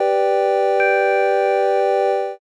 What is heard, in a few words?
church